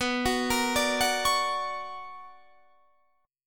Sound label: B Minor Major 9th; BmM9 arpeggiated; JGuitar